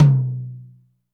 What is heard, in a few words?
dry tom, acoustic set; drum